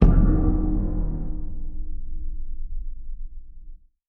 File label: notification ethics generic; Stellaris; paragon; notifications